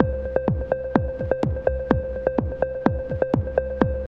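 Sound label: drum loop; X-Static Goldmine 3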